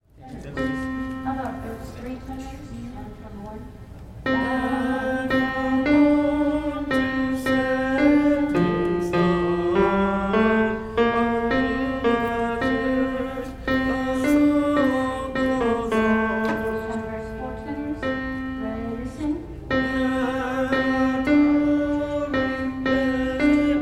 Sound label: tenor; Holy God We Praise Thy Name; repeats vs 3 and 4; Gather Hymnal